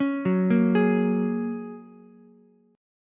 F sharp Major Add 9th, Ukulele; arpeggiated; 6,6,6,11

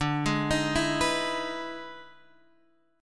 E Major 7th D, E/D Major 7th chord; EM7/D Chord {X 5 6 8 5 7} arpeggiated

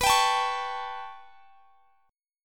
B 7th Suspended 2nd Sharp 5th down, chord; B7sus2#5; strummed

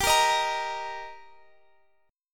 G Minor Sharp 5th G down; strummed; Gm#5

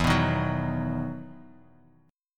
E Major 7th Suspended 2nd E; EM7sus2 strummed

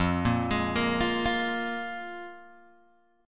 F Minor Double Flat 5th, Guitar, Open G

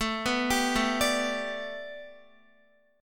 A 7th Suspended 2nd Suspended 4th Guitar

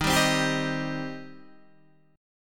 D sharp 6th, D sharp, down, Guitar, Open D

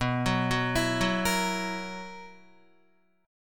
B Major 7th Suspended 4th, Guitar; arpeggiated; guitar chord

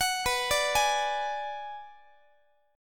B-Minor 6th B down Guitar; arpeggiated; 4A,4E,5A,5D,5G#,6C#; x,x,21,21,18,19

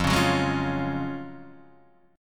F sharp Major 7th Suspended 2nd F

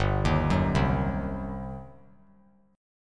G Major 7th Suspended 4th Sharp 5th, G, down; GM7sus4#5 arpeggiated

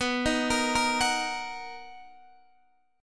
B Major 7th B down Guitar Open D

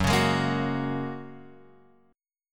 F sharp Major Add 9th, Guitar, Open F; F#add9 strummed

